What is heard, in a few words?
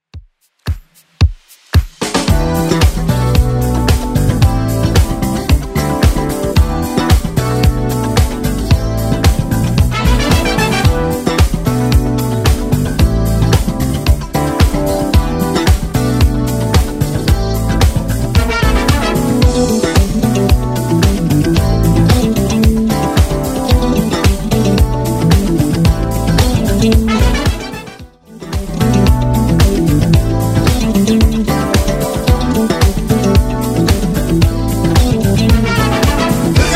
70s Redrum 112bpm